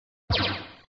Sound SFX, flipper; sttng

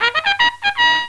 Bonus

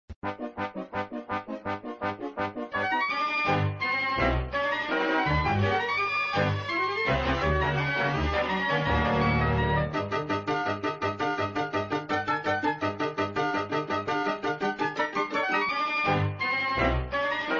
Streets of Cairo music looping; flipper

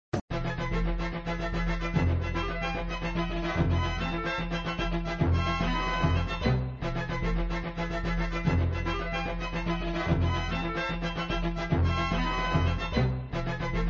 Music Castle music looping; flipper; Castle gruenwald music (looping)

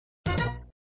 Sound Fanfare End of Ball, flipper

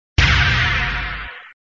Sound SFX, flipper; sttng

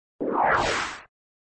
Sound Tone, flipper; Tone v1

